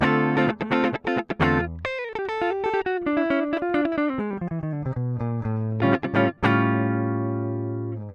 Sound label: Boss Bypass